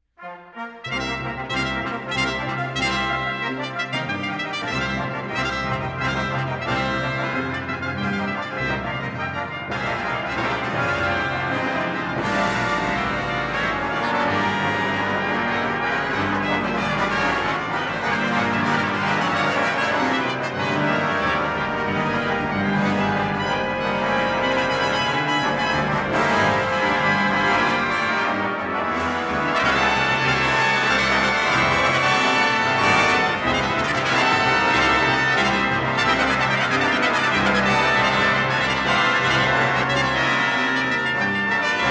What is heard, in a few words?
anonymous fanfare